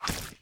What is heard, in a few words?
hits